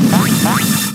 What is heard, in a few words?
Revelation Dance; battle anims; PokeRogue